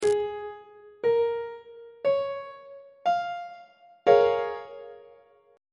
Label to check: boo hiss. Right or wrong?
Wrong